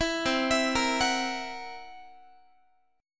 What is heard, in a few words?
C 7th Flat 5th C down Guitar; C7b5 arpeggiated; 19,20,19,20,22,x